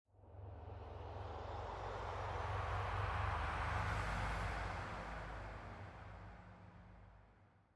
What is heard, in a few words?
spooky ambient sound